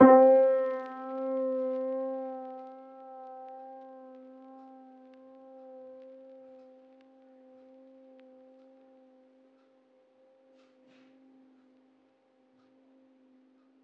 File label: lofi piano; bespoke - Floral v.1